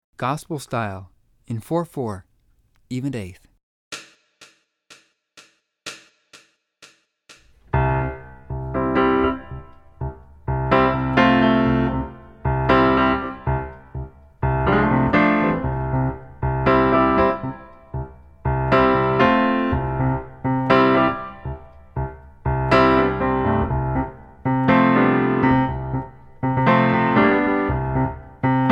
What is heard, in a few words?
gospel